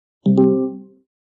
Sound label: airpods connected